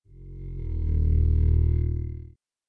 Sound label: skeleton conscious